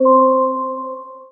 bell muted C; Melodies; One Shots; Slate; AURORA Sample Pack

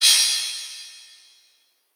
cymbal dirty; Hip-Hop; Drum One Shots; SD CAICOS Hip-Hop Sample Pack; Slate

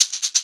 perc dark shake; Drum Sample Pack; One Shots; Slate; SEISMIC

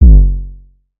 Synth oneshot Short F#, Synth Bass; Slate Digital BASSHOLE Sample Pack

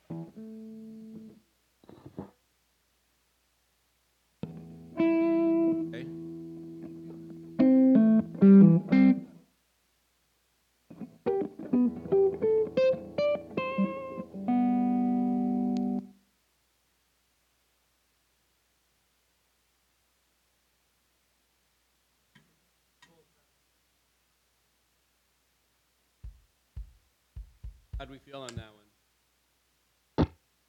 Strings

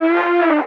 vocal mood Dm; SD AURORA Sample Pack; Slate

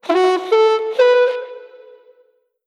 phrase F#m, Vocal; Slate Digital Omnivox Vocal Sample Pack

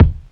kick sonic; Drums; One Shots; Slate; SD AURORA Sample Pack

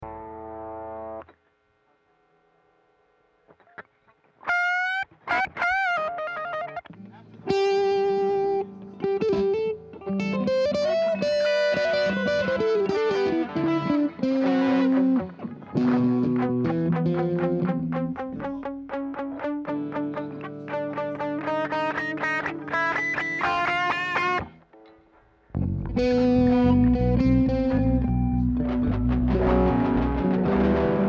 Guitars and Bass

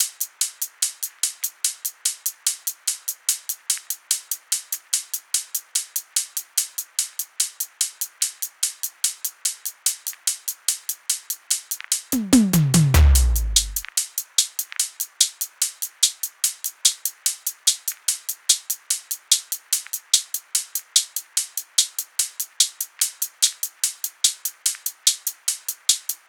SEISMIC hats 73; Hat Loops; drum sample pack; Opala; Slate

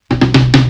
tom rich; Drums; One Shots; Slate; AURORA Sample Pack; Toms

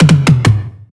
tom, Drum One Shots; Slate; SD MIDNIGHT Sample Pack

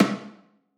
percussion oneshot form; Pop; Drums; SD PASADENA Pop Sample Pack; Slate

